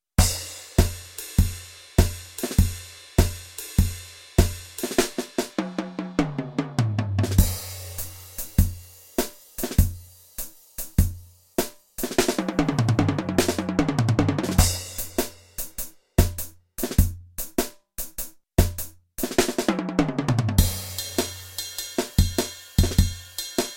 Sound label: JAZZ Beats Example